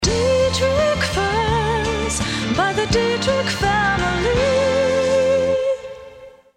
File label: jingle